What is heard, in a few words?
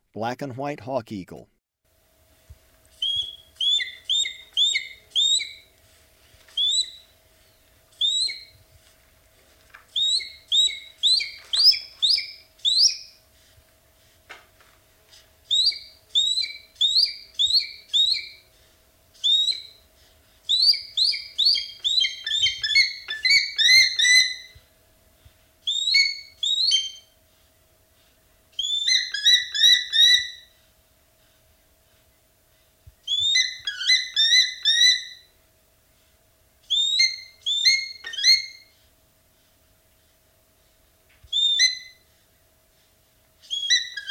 Black and white Hawk-Eagle, Birds of Ecuador; S. America